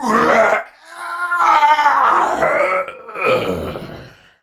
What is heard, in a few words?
Death; Warframe; Tau; Dax; Ashigaru